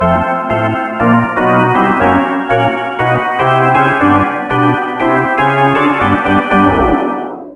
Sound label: Ditty; NBA ShootOut 2003; ps1; ps2